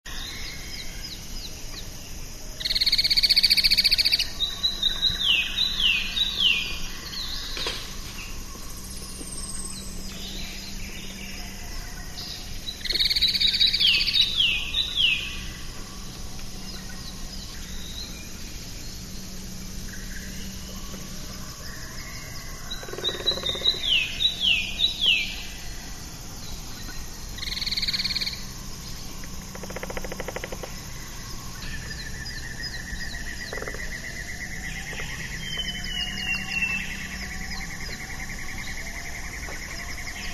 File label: buff rumped woodpecker